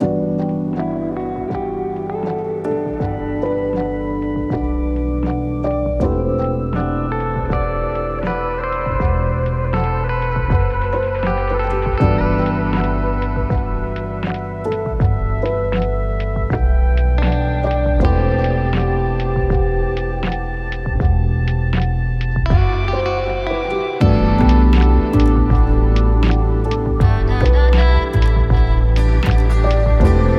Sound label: Lofi; Snore Lax